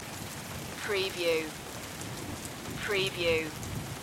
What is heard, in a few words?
rain with very distant thunder stereo loop